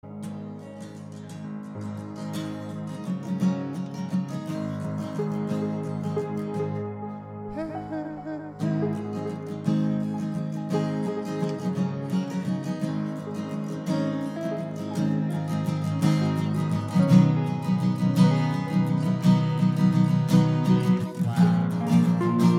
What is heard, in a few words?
acoustic, piano